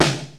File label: RX7 HEAV SD; Crypton - DrumBase5000